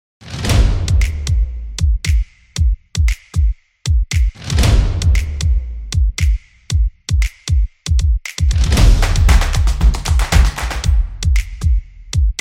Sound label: Rhythmic Drums